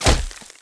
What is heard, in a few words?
BI HIT permanent aliens; Giants